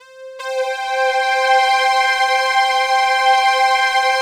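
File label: PAD, atmospheric pads; Best Service; XX Large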